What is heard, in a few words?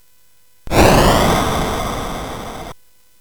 Dark Castle, CSG8580R5